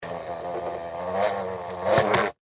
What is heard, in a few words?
Hornet, Attack, Short, Land, Fly, Wings, Buzz, Insect, Bug; Sound Effects:Animals:Insects; Blastwave